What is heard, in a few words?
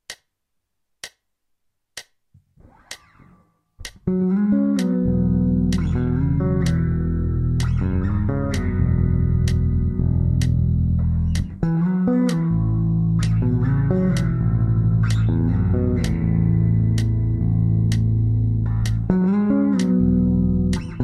Harmonizing the major pentatonic scale